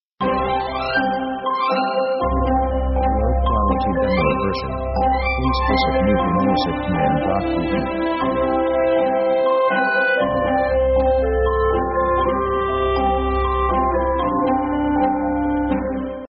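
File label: orchestra cheerful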